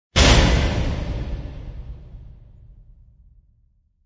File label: Rage Smash; Gothic Storm Music